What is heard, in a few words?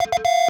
Error, Electronic; SFX